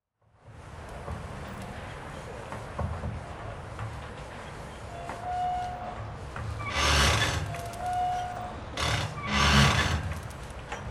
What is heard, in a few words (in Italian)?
paesaggio sonoro; soundscape